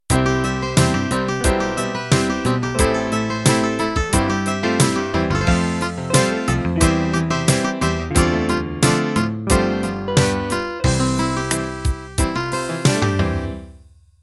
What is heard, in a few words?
country-pop ballad intro